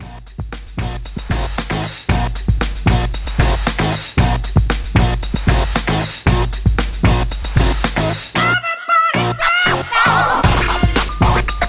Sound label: Scratch Classic 115Bpm